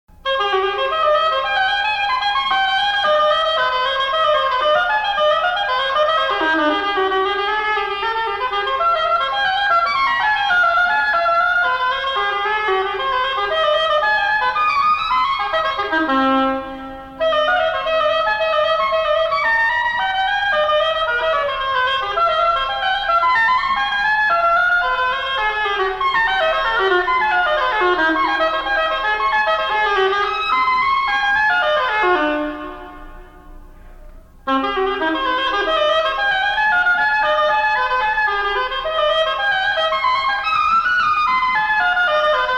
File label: oboe